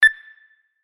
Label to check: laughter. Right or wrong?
Wrong